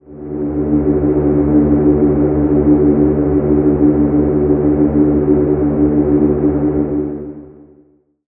Atmosphere D 145 BPM, Psytrance; Cyberplanck; Ghosthack; Psytrance Visions Volume 2

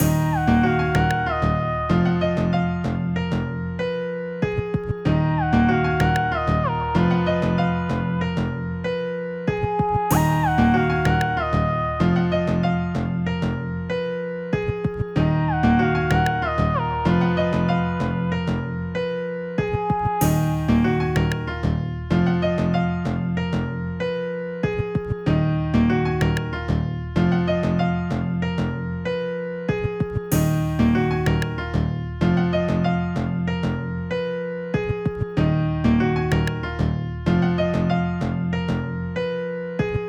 95 BPM D# Min, Hip Hop Melodies West Coast; Dope

